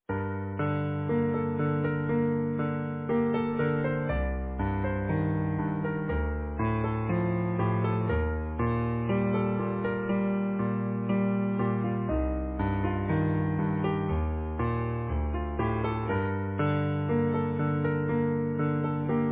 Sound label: Easy Piano